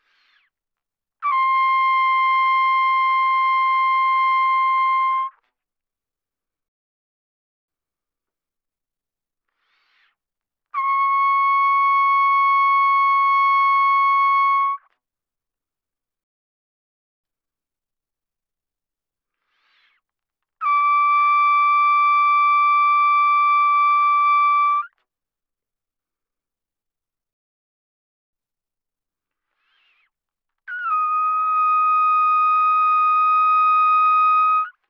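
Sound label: Trumpet no vibrato pp C6 Eb6; Brass; Bbtrumpet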